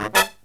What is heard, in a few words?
low chord, brass riffs; USB Soundscan vol.29; AKAI